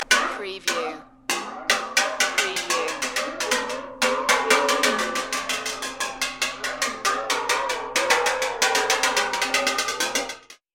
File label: Warped Pot Bang